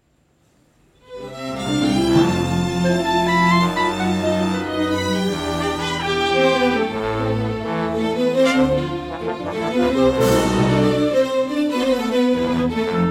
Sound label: Foley Soul Bass Prelude